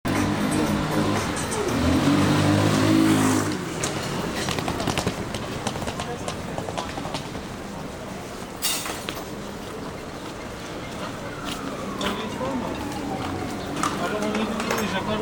besiktas soundscape

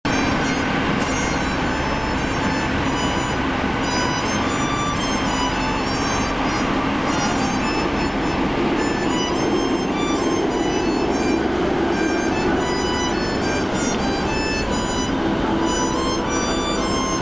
metro harmonica beggar

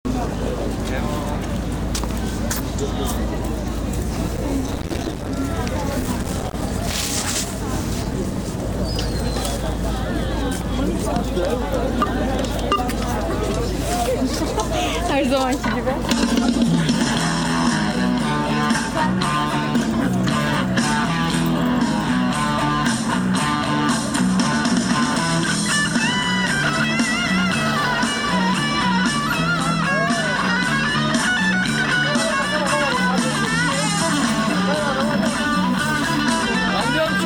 kadikoy rock soundscape

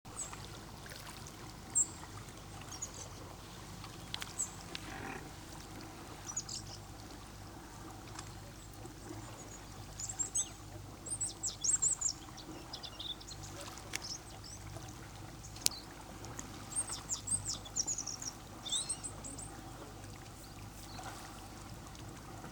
bitez ada bogaz soundscape

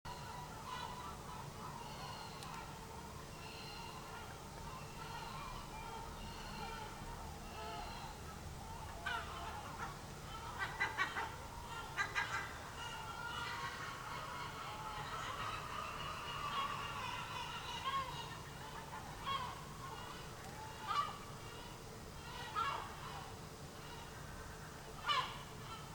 seagulls at dawn

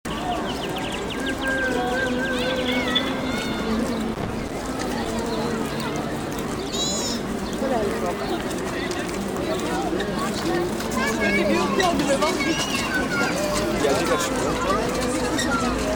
izmir kemeralti soundscape

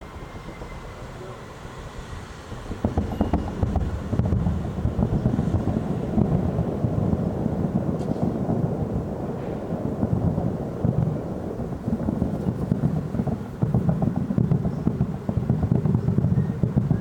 republic day fireworks soundscape

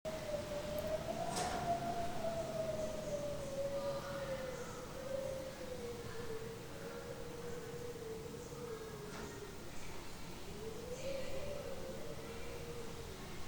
sisli wind in apartment

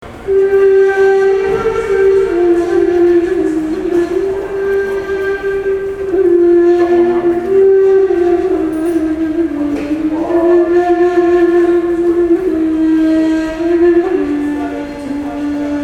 metro ney musician soundscape

